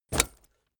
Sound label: leather metal bodydrop